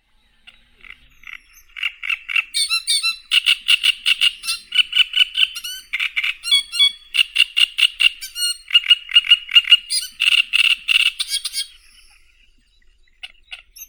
kamishovka drozdovidnaya acrocephalus arundinaceus

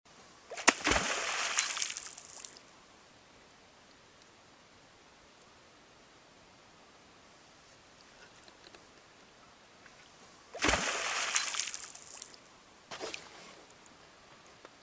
bobr kanadskii castor canadensis udar hvostom

